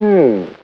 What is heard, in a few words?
mmm, boy from the fifties; ooh ahh